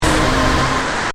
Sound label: Static Synth, Static, Synth, Blast; Production Element, Imaging Element, Accent, Transition; Static Sound Effects